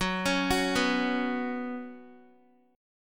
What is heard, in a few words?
Gb Suspended 4th, Guitar; arpeggiated; x,9,11,11,11,x; 4E,4A,5D,5G,5C,6F; JGuitar